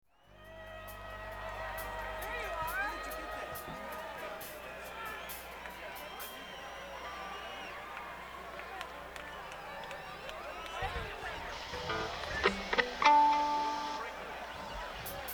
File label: Tuning